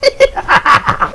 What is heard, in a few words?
hihihaha